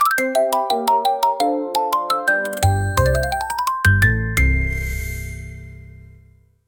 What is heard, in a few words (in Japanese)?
fuwari scene change; free jingles